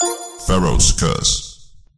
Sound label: pick skull; Geschicklichkeit; Bricks Of Egypt